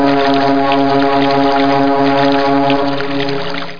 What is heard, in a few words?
ship; My Animated Word Book; move